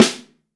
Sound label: MAPLE SNARE